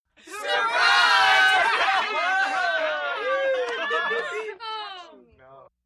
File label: Surprise Grp Yelli, party